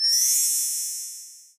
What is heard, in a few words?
Goodbye